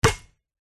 sport basket ball very hard bounce x1 on asphalt concrete